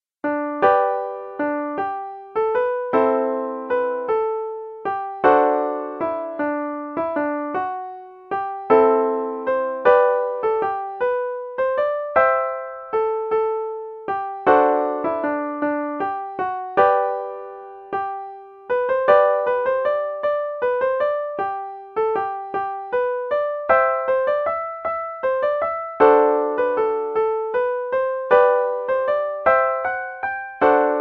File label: Swing Waltz Set, Fiddlers; Joans